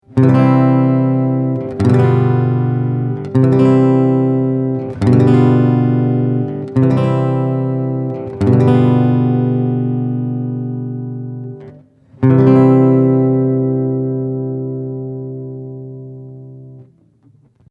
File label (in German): Septakkord C G7 C